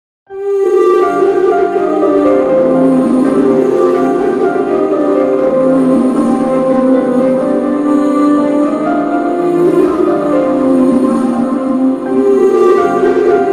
Love Bgm, New South Love Bgm Ringtone love bgm ringtone; Raayan